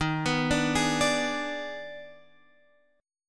D sharp Major 7th Suspended 4th Sharp 5th, Guitar, Open F; arpeggiated